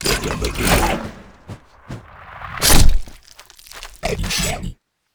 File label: terracotta throw impale